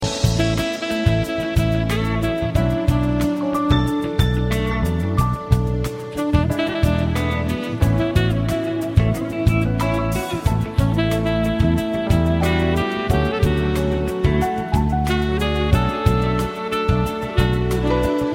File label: Sax